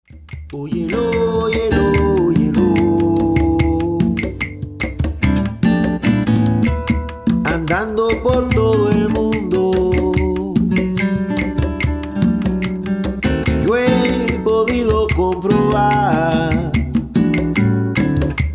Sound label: rumba